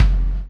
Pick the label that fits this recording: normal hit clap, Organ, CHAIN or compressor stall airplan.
CHAIN